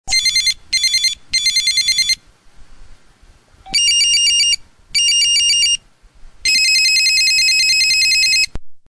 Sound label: tech tune sound